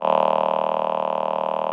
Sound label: VP-330, String Machines